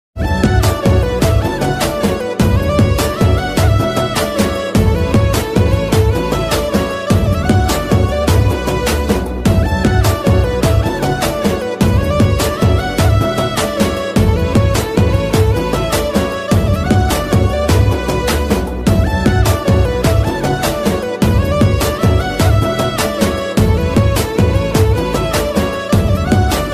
odia ringtone odia love ringtone odia ringtone music